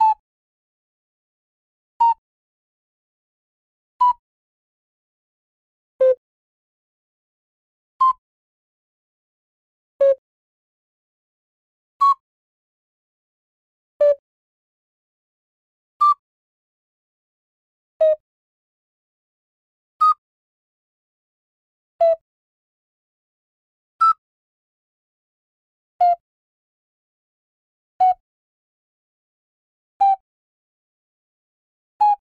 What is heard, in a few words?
ocarina